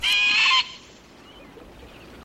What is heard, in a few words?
single bird squawk echo